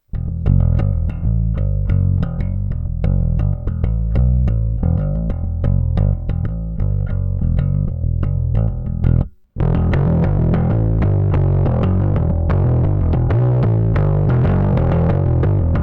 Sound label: Ampeg SCR DI Sounds